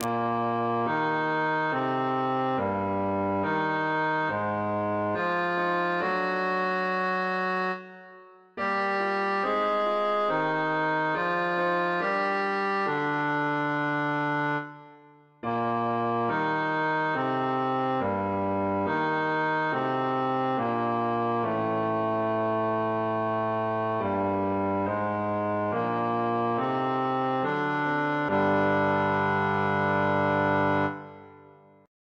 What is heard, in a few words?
bass; nun sich der tag geendet hat